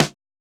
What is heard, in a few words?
snare samples, Rock